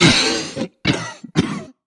troll monster cough; Infernal Radiation

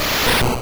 Mega Punch; sfx; attack moves; pokemon; gen 1; rby

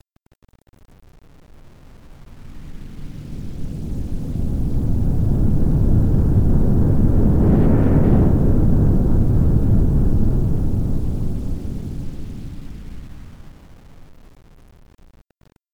Long Whoosh; Learn to Fly 3

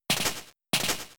Double Slap 2 hits

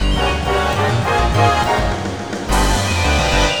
Boss Battle Intro; Skies of Arcadia; Dreamcast

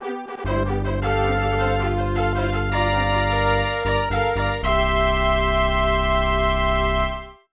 Victory; kouryuuki 1994 snes

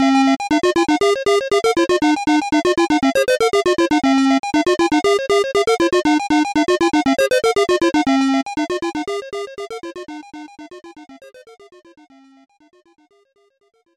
Level BGM (Faster)

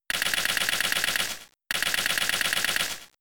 Bullet Seed 2hits; Pokemon; Gen 3; attack moves; RSE

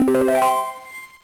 Chime; Chu Chu Rocket; GBA

